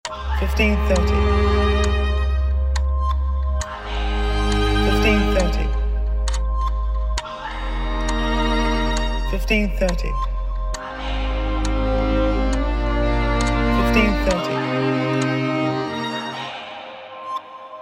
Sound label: 146bpm; FrenchQuarter